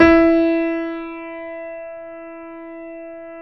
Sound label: E 3, Keyboards Acoustic, GRANDP ST; USB Soundscan vol.17